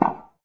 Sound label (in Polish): kopniecie; Szkola Koziolka